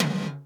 PERC GATED; Crypton; DrumBase5000

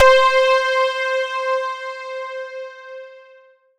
OBXA C5, Oberheim OB-Xa, Synths; Keys

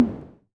Tom High, Korg KR-55B

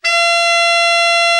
ALT, Sax, ALT.VEL-FALL; Giga Samples Collection

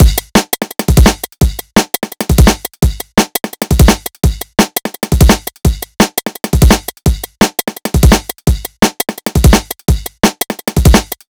DNB Beat, Electronic Beats; Drum n Bass; Loops; Beats and Percussion; KJ Sawka; Drum n Bass & Beyond

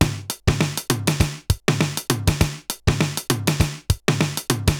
RX17 (100BPM), cyborg beats; musicradar

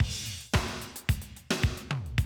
Classic DrumLoops, 110

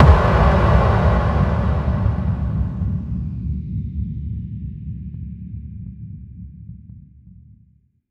FX Kicks

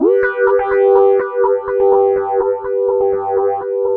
Analog Rhythm; E-MU Producer Series Vol. 6 – Analogia Project (CD 1)

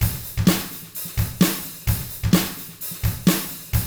HARD ROCK, Drums

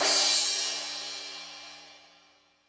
Lazer Drums, E-MU Pro-Cussion; drum machine

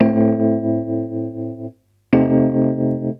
RHOD, Roots Disco; USB Soundscan vol.32; AKAI

